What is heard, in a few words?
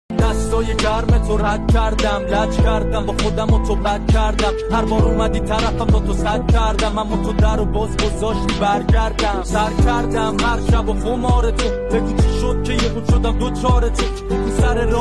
Remix